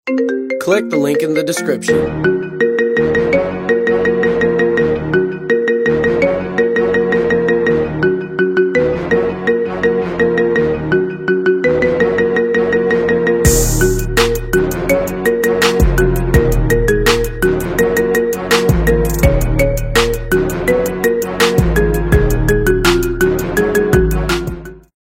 Marimba Remix

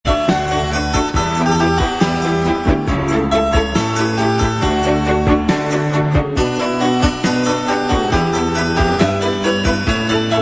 menuet

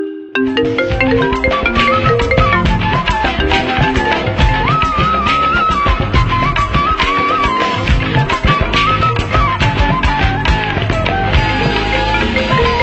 iPhone Classical, Klingelton

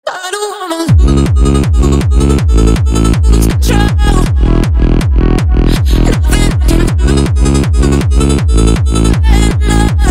Techno Mix

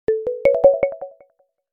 HTC 10 Sense 8 Sound Suite Stock Notification; Filbert

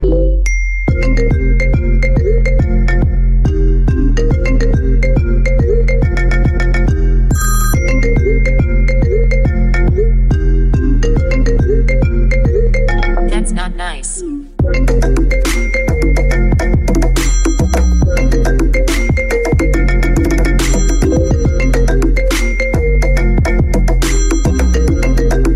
iPhone Remix

